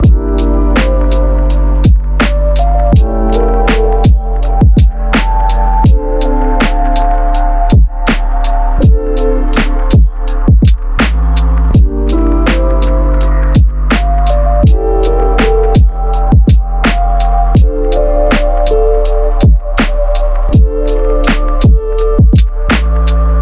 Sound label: beat